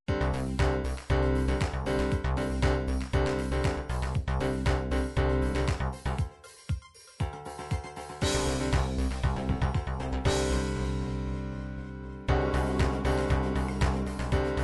dance pop, midi

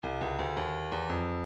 breakbeat midi files 140 170 bpm 170; Stylus

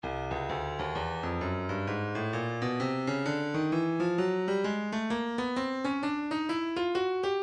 breakbeat 065 bpm chill; Stylus Breakbeat Midi Files

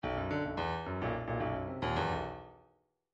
bdf midi file grooves funk q funk q

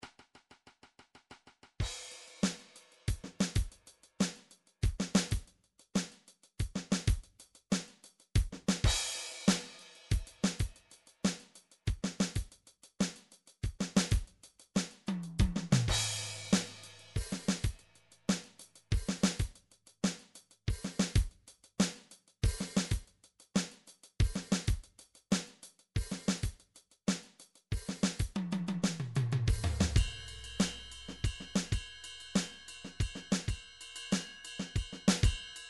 odd meters

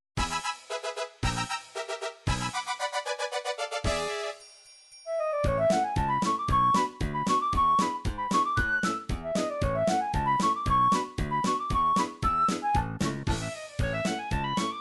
russian ukrainian polka, midi

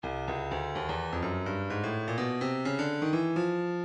breakbeat midi files 125 129 bpm 126; Twosome B; Stylus Breakbeat Midi Files

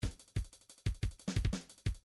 drums afro cuba

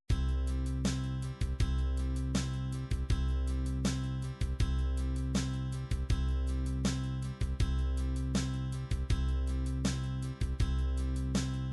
16 beat, midi

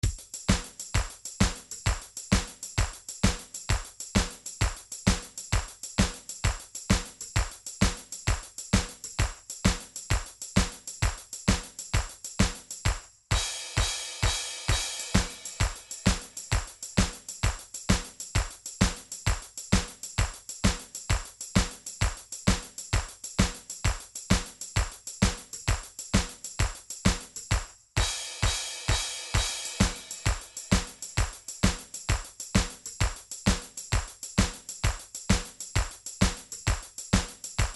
midi drums drums ethnic

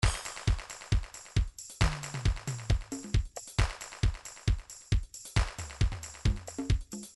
trance midi loops beats; Newtronic Trance Constuction Kit

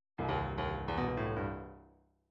midi fills rock

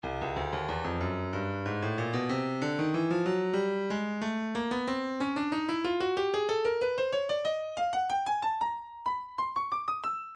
percussion congas 093 congas; Stylus

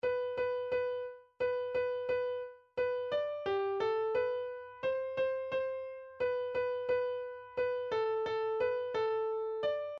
jingle, midi

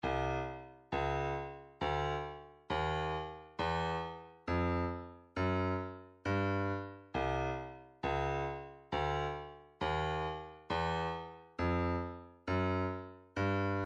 separated elements snare; 135 Wide Shut

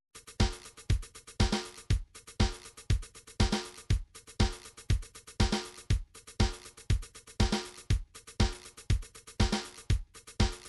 drums, midi drums

